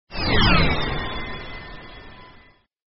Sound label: rocket; soldiersfury